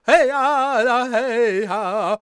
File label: C# MIN, World Voices, LAKOTA